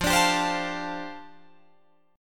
F 6th Add 9th F down Guitar Drop D, chord

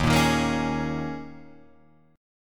D sharp 7th Suspended 4th, Guitar, Open F; D#7sus4; strummed

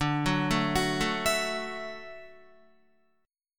D-Major Add 9th D down Guitar; arpeggiated; JGuitar

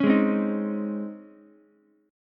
C sharp Major 7th Suspended 2nd, Ukulele; C#M7sus2 strummed; 6,8,8,6; JGuitar

